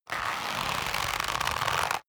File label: leather creak medium